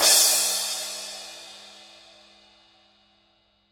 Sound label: signature 14 full crash edge